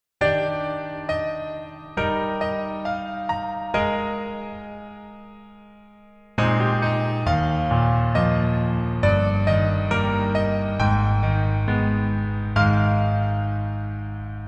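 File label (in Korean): Piano version 남자키; 하이바이, 마마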